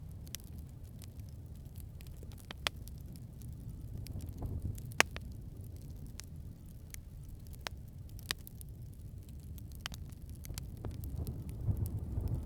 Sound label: surrounding fire crackles